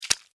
Scorpion Tail Sting, Scorpion, Tail, Stinger, Strike, Impact, Attack, Arachnid; Animals:Insects; Insect, Bug; Scorpions; Blastwave